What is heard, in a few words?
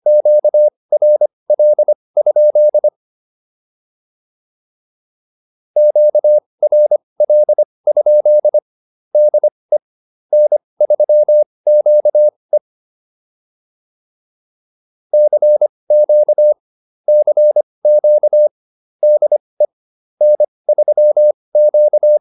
QSO, advanced practice files